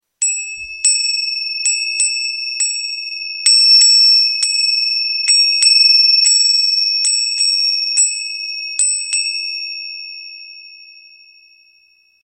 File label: manjira; instruments